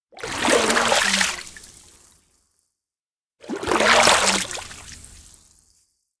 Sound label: fishing with net; sounddivision